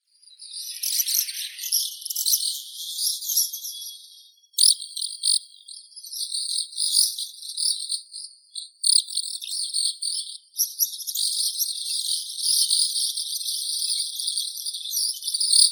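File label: Asian house martin, Delichon dasypus; lastochka gorodskaya vostochnaya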